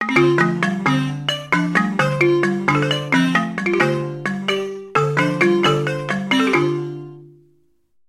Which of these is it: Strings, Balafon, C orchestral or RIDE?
Balafon